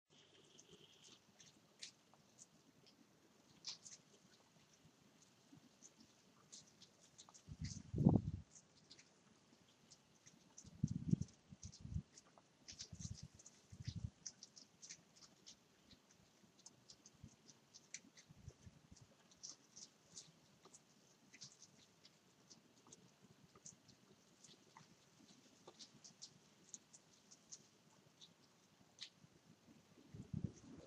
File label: rain falling